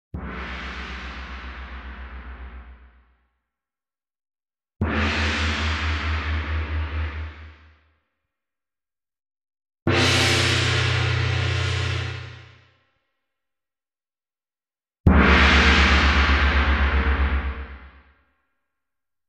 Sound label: Gong